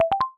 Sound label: Compass Success; iOS 27